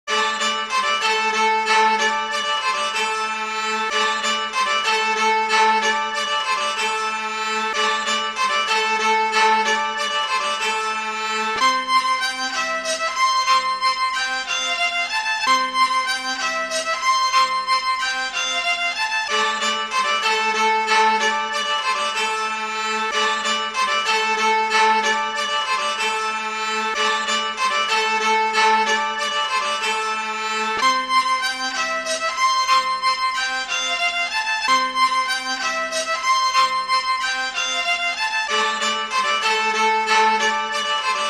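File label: Sweden Folk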